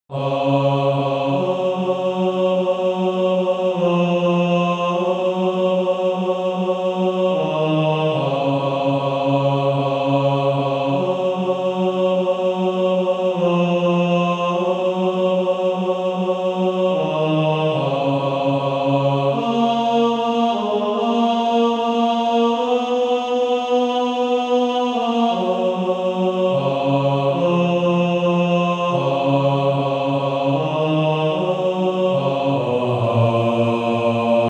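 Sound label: Male Choir, bass 2; On Christ the Solid Rock I Stand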